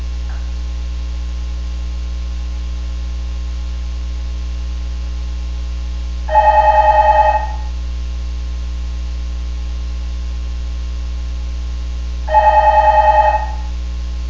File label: psl mic